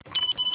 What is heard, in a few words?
RCX Power On